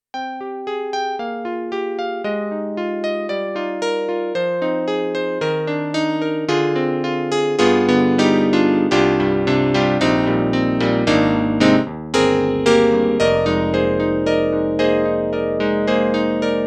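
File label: Korg Triton-Rack Electric Grand Piano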